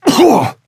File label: Grunt Hit